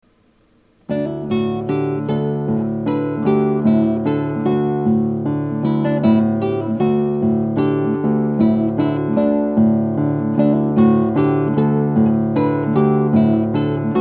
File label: saltarello lute